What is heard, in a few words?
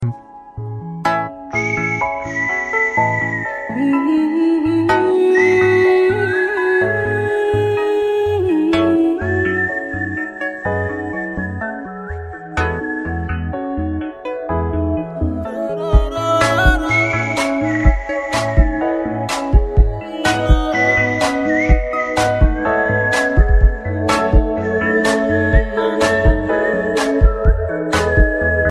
love sad music